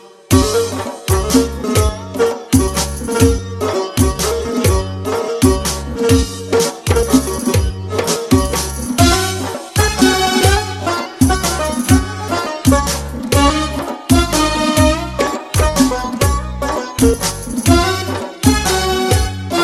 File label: Melody